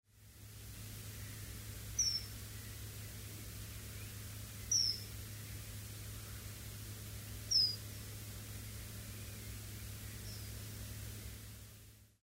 kingfisher nocmig

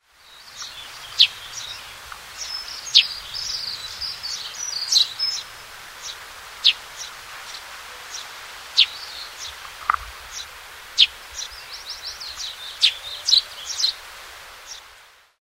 tree sparrow

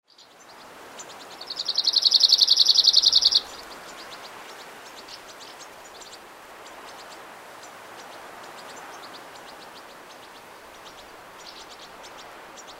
cirl bunting

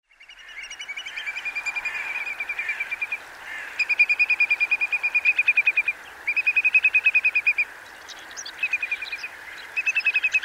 whimbrel

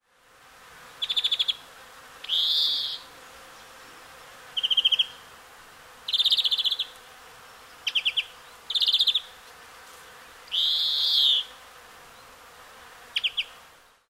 greenfinch

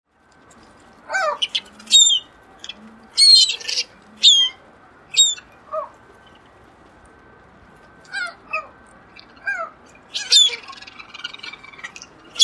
mediterranean gull

